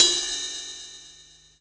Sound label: Real Open Hats